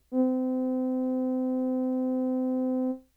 ミニ・モーグ, 電鳴楽器

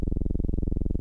ミニ・モーグ, 電鳴楽器; 最低音４音色

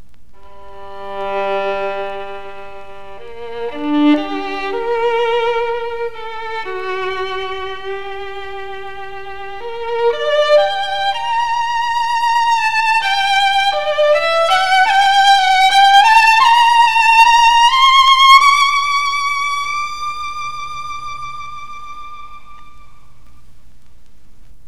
Guarneri, 擦弦; 弦鳴楽器

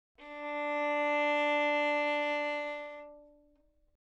ヴァイオリン, 擦弦; 弦鳴楽器; 開放

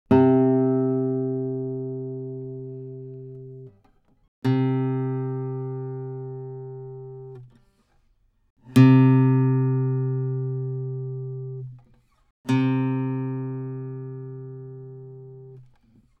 撥弦; 弦鳴楽器; C3

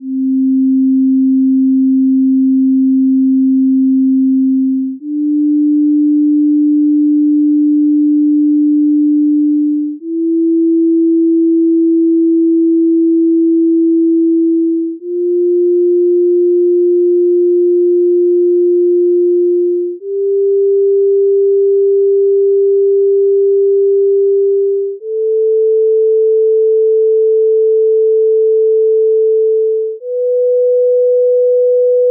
正弦波1本による音階; 付録D：音階・音律; 各種音律

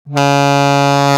アルトサックス時間逆転音 ASax Db3 revers; 持続音

